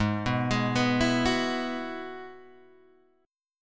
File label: G sharp Minor 7th Sharp 5th G sharp, Guitar Open G; 6,4,6,4,5,4